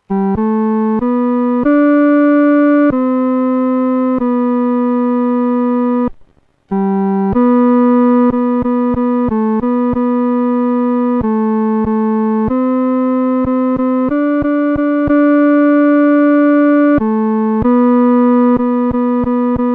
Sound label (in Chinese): 独奏 第三声